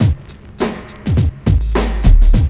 LOOP 103, Hip Hop, CHRONIC LOOP; InVision Interactive; 40 Oz Phat Slammin

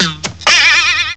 ARROW; M8; CMI Factory

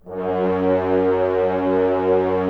Brass F.Hrns F#; Miroslav Vitous - Woodwind Brass Ensemble

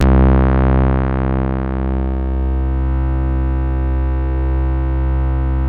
PHAT BASE, BASSES; Masterbits; Vintage Voltage

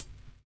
LOVE SNAR; Univers Sons

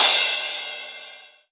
Crash, Cymbals; Vengeance - Essential Clubsounds Vol.5

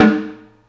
Bo Tom, Tom One-Shots; KJ Sawka - Drum n Bass & Beyond; Drum Tool Box

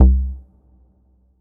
bass stab

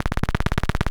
LOOP, Drum & Bass, 130 BPM; Ueberschall; Drum & Bass Flash Trackz

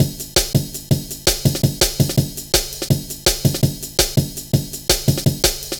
Drum Loops, 165.5; Zero-G Jungle Warfare 1, 2 & 3

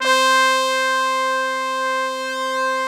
BRASS C4, ORCH BRASS; Greytsounds VOL-1

